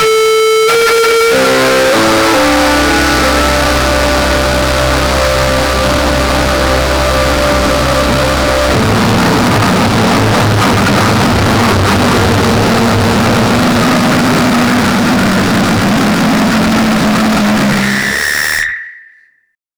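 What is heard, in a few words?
a cliffhanger theme, build up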